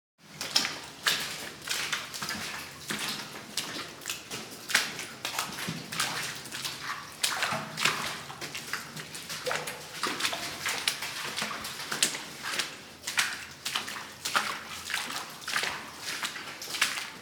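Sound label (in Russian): хлюпающие шаги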